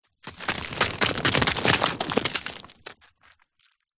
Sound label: mud dried clumps small fall roll hill break crumble landslide; zapsplat; foley